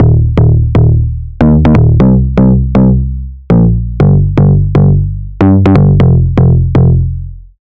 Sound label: Bass; Black Samurai; Routed Sound; Vital Expansion - Noble Gangster Vol.1